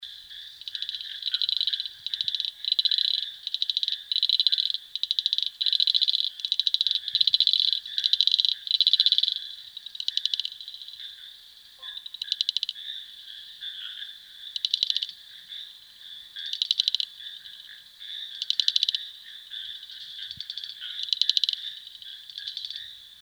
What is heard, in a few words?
Frog Clicking Crinia